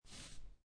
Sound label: Poker Chips Slide One Chip On Felt; Gambling; Casinos